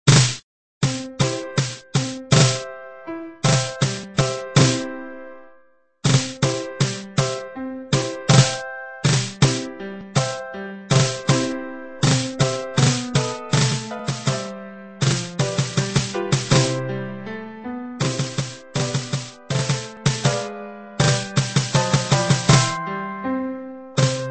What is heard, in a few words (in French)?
tambourine pour tambour et piano